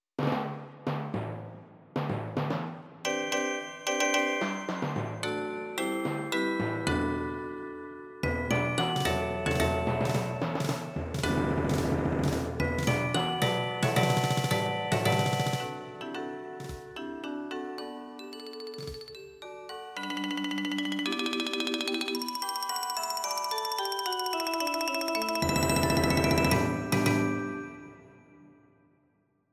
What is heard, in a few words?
Fanfare